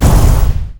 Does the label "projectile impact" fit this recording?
Yes